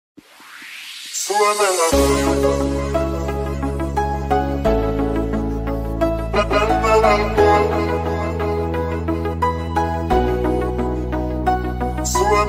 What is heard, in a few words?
Hardstyle Remix